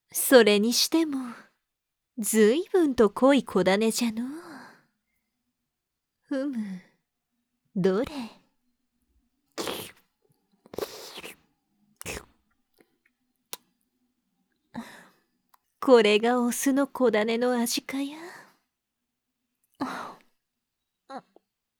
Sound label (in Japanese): 甘いディープキス